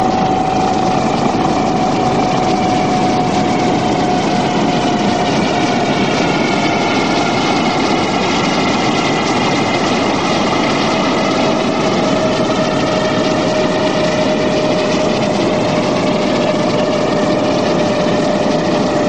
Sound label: helicopter sound